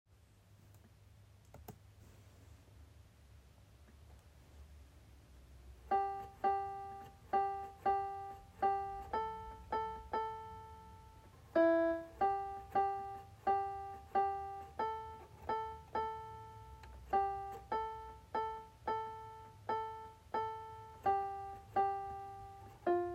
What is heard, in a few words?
Piano